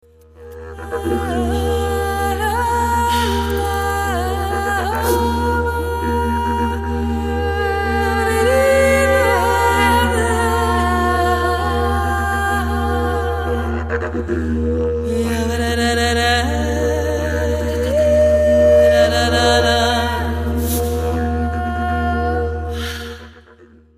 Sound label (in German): didgi